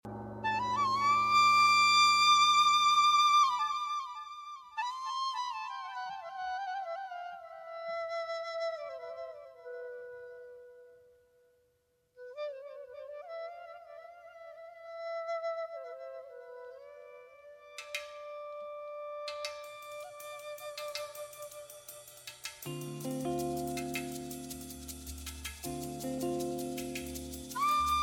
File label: Flute Jam; Coke Studio; Best of Coke Studio